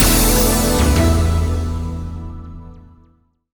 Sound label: win snd; netent